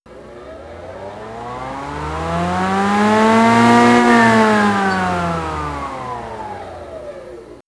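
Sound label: On Off, electronic fx; X-Static Goldmine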